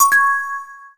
Buying Upgrades

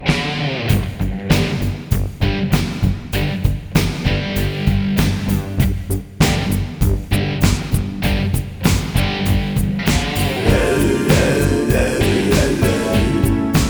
concert loop; zombies5

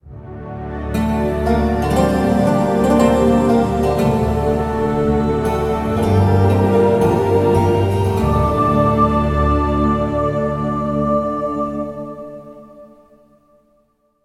achievement

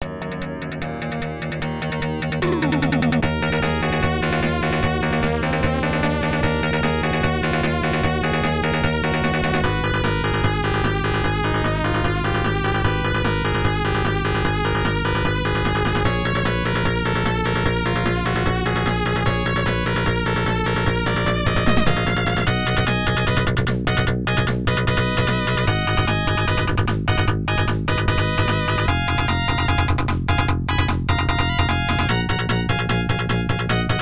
Battle; Emerald Dragon; pc-88

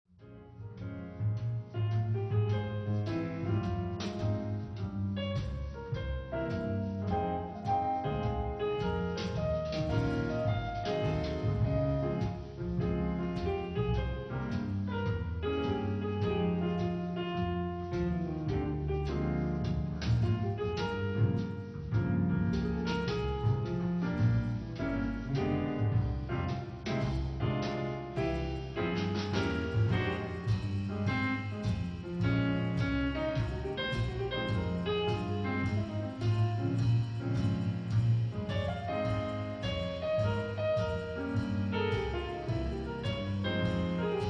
Jazz; Usen; Fist of the North Star Lost Paradise; Hokuto ga Gotoku; PlayStation 4 gamerip

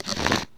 page open

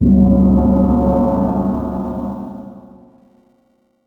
Music Effect; Drake of the 99 Dragons

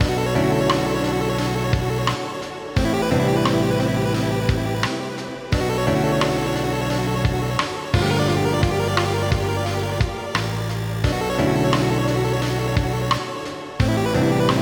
Game Over Screen Loop; Cursed to Golf; PS4 PS5 Switch Windows Xbox One Xbox Series X/S gamerip

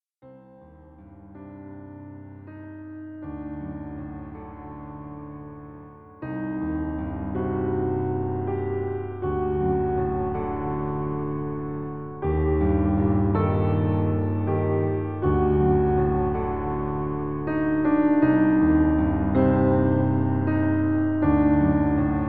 The unknown (piano); Cursed Sight original soundtrack

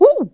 surprised; Scrat

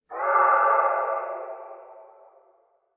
magic organic flutter ethereal

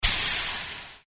Player Hurt Acid; Acid Rising

